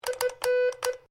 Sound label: MORSE CODE F; Morse code aplphabete; Morse Code (Sound Effects:Electronics)